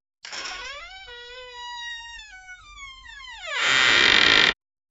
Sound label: a door creaking as it